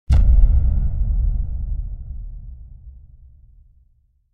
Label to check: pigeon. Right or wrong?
Wrong